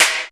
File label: GATE CLAP; Crypton - DrumBase5000; GATE KITS